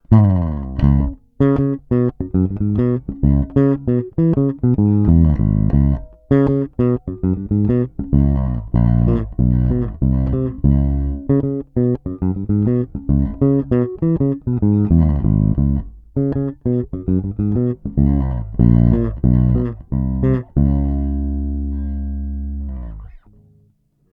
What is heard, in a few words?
Both flat finger